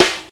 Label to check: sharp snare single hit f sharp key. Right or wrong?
Right